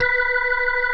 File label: B4, PERCORG; Sonic Reality; Vintage Timetraveler